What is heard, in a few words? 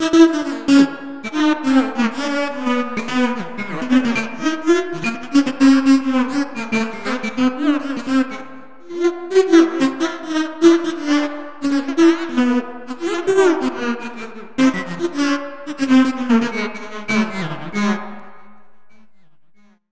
drum